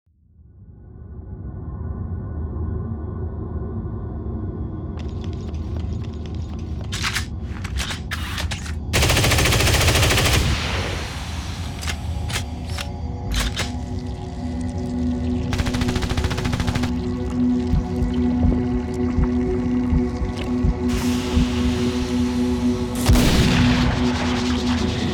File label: marine ambient montage